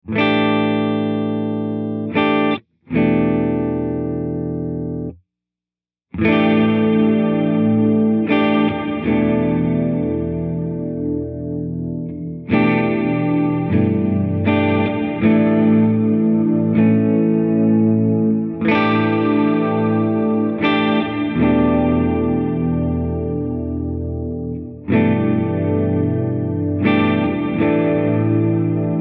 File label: Modulation Modulated; Moon Base